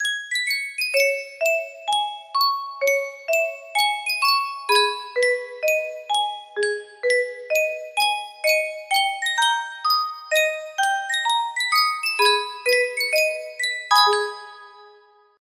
Yunsheng custom tune music box

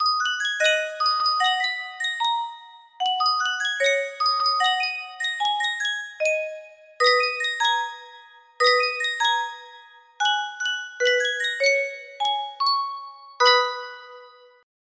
Sankyo music box, music box melody

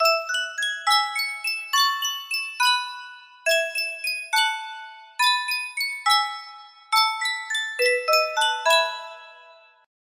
sankyo miniature music box

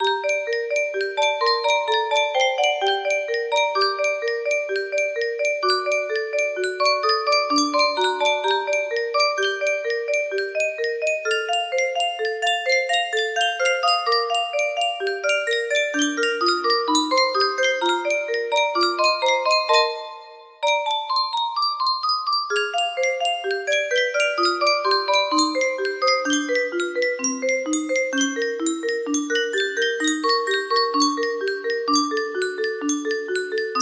melody; mozart piano sonata no 16 in c major k 545 2nd mov

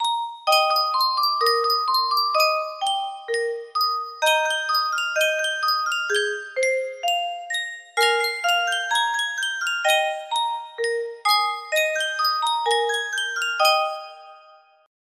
Yunsheng custom tune music box, music box melody

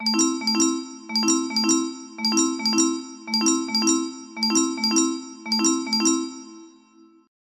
bass track, music box melody

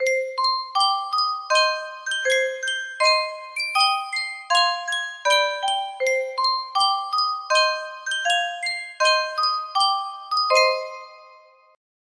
sankyo music box

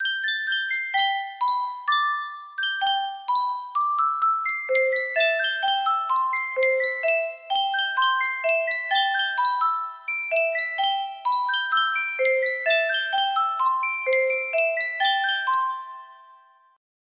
music box

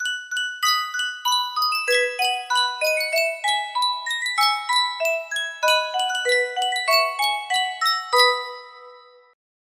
sankyo miniature music box

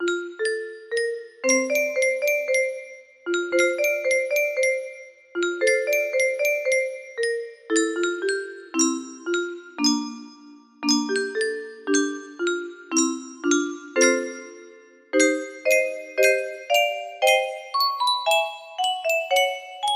music box, music box melody

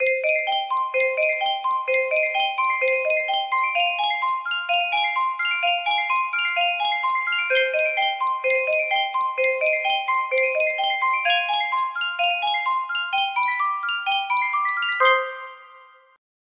Yunsheng music box, music box melody

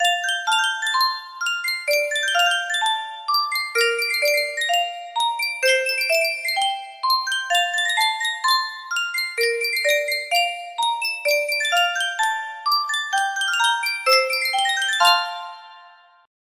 music box; yunsheng custom tune

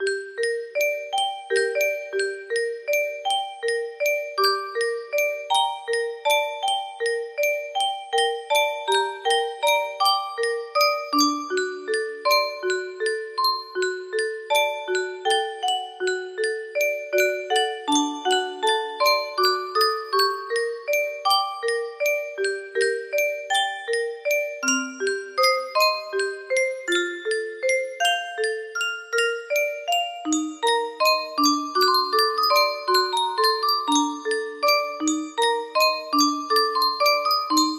waltz, music box melody

